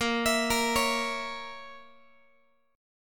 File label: A sharp Diminished Guitar, chord; arpeggiated; JGuitar